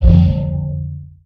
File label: Pickup Magic; SFX Kit; Pickups